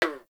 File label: Forest Frog Close; Warframe; Grineer